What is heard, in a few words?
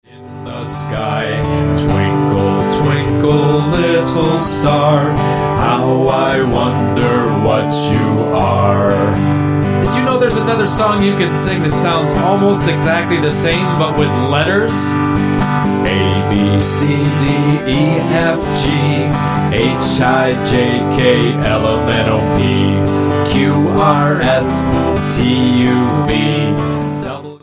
twinkle abc baa baa